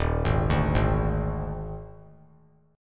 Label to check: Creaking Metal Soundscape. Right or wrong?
Wrong